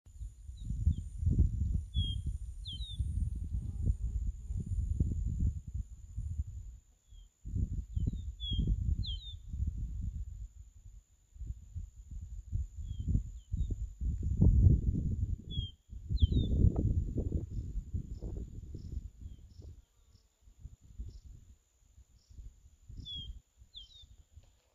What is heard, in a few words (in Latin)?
Sporophila